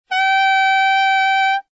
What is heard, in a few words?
A6 alt, saxophone tenor